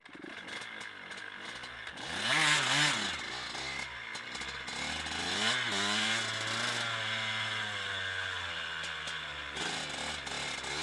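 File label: tm 125 onboard start drive jumps stop off engine left; dpa4061; Sonniss GDC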